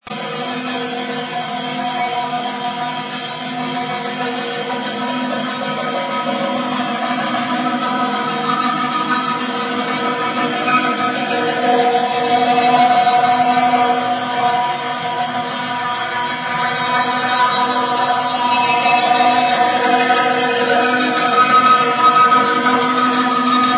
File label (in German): Spatzen; Froleyks